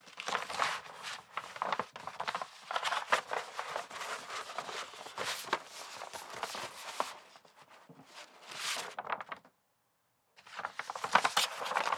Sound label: Paper Movement